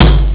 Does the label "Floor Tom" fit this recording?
Yes